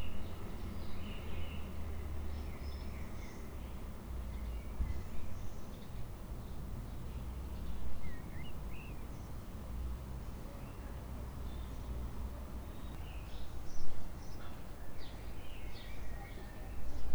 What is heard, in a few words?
ambience, Railway; Central Line; OpenBVE